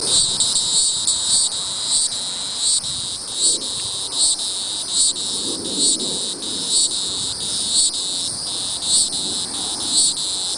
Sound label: N hieroglyphica singing; Neocicada